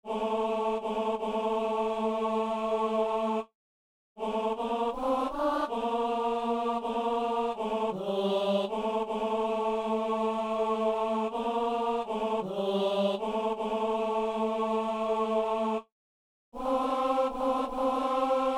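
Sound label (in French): ténor; messe coutrillonne; kyrie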